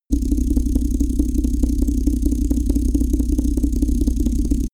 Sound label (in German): mars drone, raumschiff